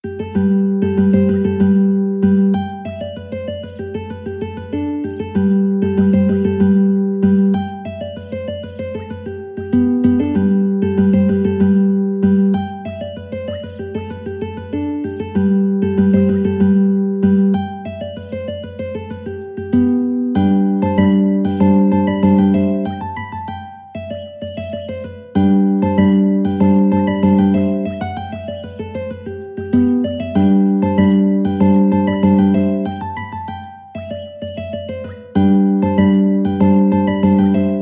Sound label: mandolin